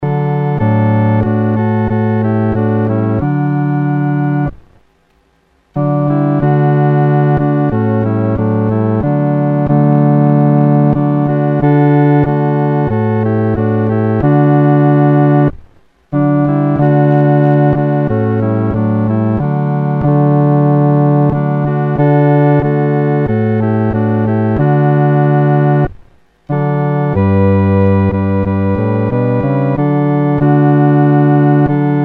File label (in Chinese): 独奏 第四声